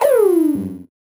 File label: VocoBit Noise, 8-bit; musicradar; 8-bit bonanza